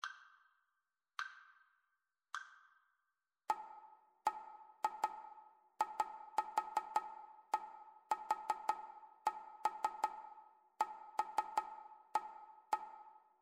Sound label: rhythmic dictation